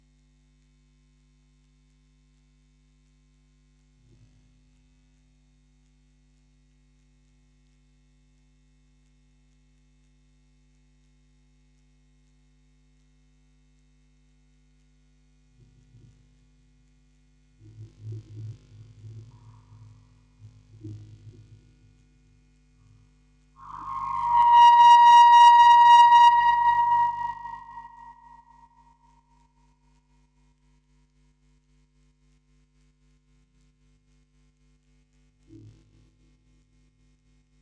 Aufnahme Vocoder, Spieluhren-Quartett; mit Tonband; Fieldrecording

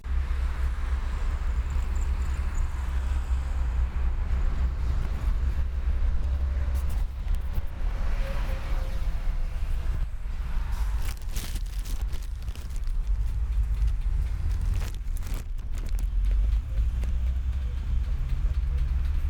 kuehlgeraete, verkehr; Fieldrecordings

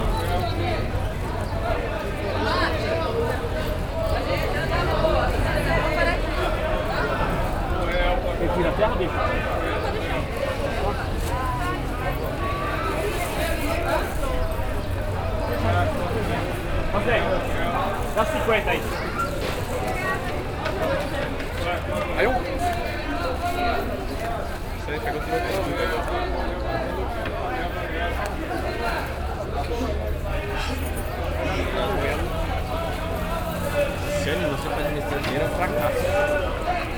aufm Markt, Fieldrecordings Brasilien

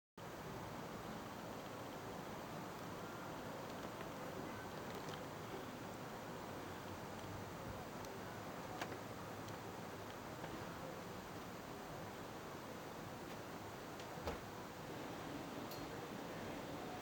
draussen, fieldrecording; Original Video Soundtrack (roh, ungeschnitten); Wolken

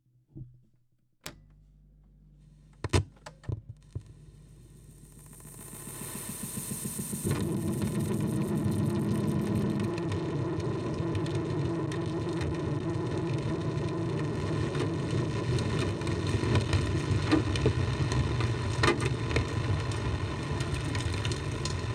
19 cm (Lautsprecher Tonband), Trommeln im Regen Tape Echo Mix

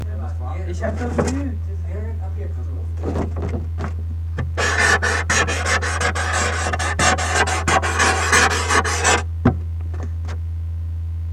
filz plaste

